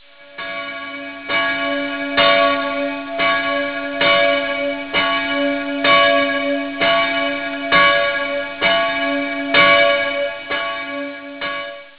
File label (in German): glocke, glockensound; pfarre